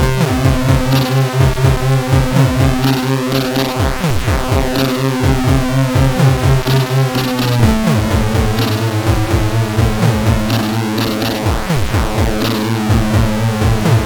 esque big bass, CSG8580R5

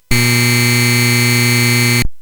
Synth Sample, CSG8580R5; hvsc

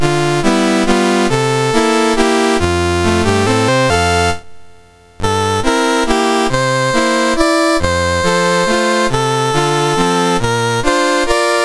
Cayman Isles, National Anthems; MOS6581R2; Dave Marquis

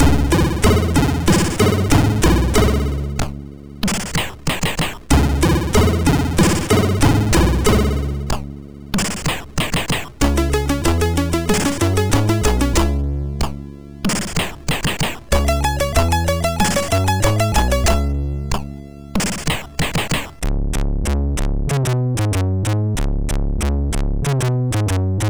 muzak, sid MOS6581R2

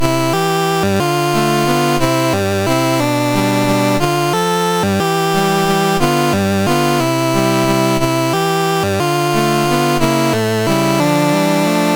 Emperor Waltz, MOS6581R2

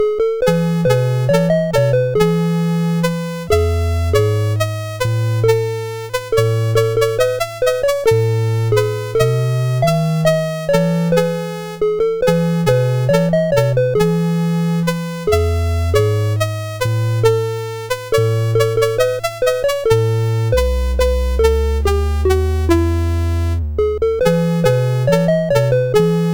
BASIC sid CSG8580R5; Singalong-a-Summer

